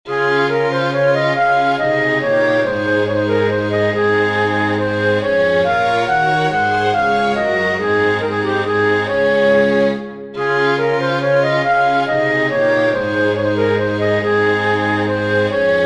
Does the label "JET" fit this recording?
No